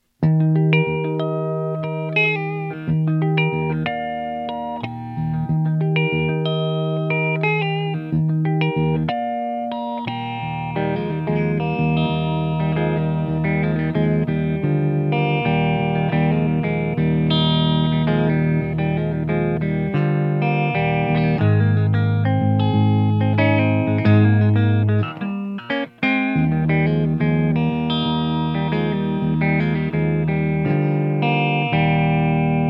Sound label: Engl RB Tuning clean